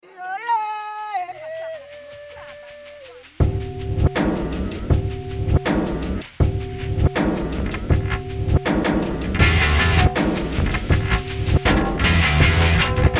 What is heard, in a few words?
drum seq